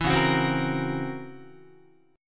C sharp Minor Major 13th C sharp Guitar; C#mM13 strummed; JGuitar

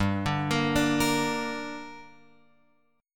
G Minor G down Guitar Open F, chord; arpeggiated; JGuitar

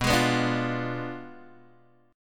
B Diminished 7th B down Guitar Open G

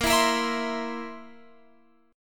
Bb Suspended 2nd Flat 5th Bb down Guitar Open F; Bbsus2b5 strummed

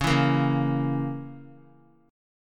C sharp Minor 6th; C#m6; strummed; JGuitar

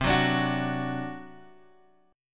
C Suspended 2nd Flat 5th C Guitar Open D; down; chord shape

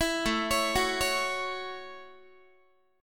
A 6th A Guitar, chord; A6 arpeggiated; 19,17,16,16,17,x

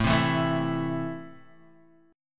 A Minor A Guitar Half Step Down, chord

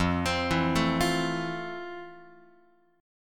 F Major 7th F, Guitar; arpeggiated

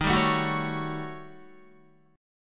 Eb 7th Sharp 9th Flat 5th, Guitar; strummed; 10,10,9,11,9,9; 4F,4A,5C,5G,5C,6E

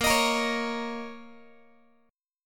A sharp Minor Double Flat 5th, Guitar; strummed; x,18,18,20,17,x; 4A,4E,5A,5D,5G#,6C#; JGuitar

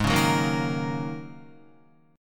G sharp 7th Suspended 2nd Suspended 4th, Guitar; strummed; 3,4,6,3,3,6; 4F,4A,5C,5G,5C,6E; G#7sus2sus4

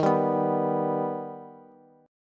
Eb Augmented, Bass; strummed

